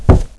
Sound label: carpet; valve